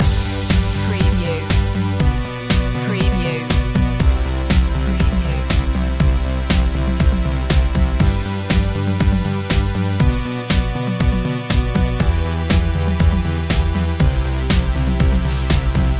hokkaido loop

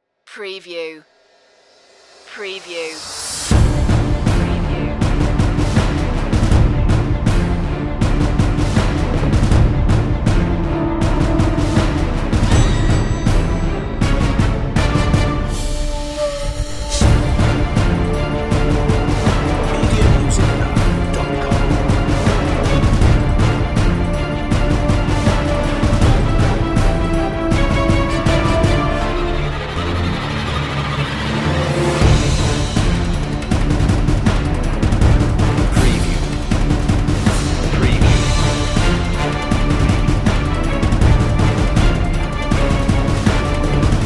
no choir version

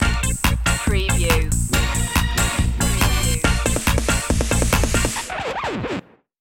short vintage dance cue loop end

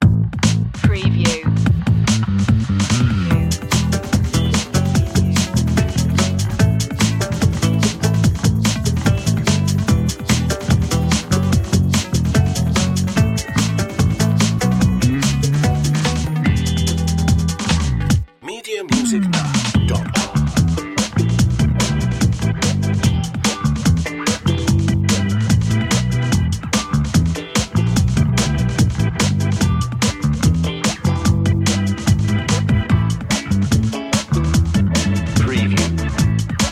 fast and funky

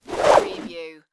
Whip Movement Slow; Sound Effects Bible

